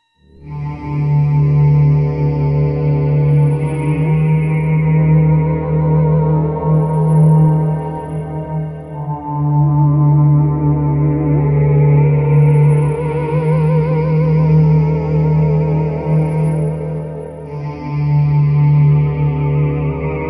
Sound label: scary theme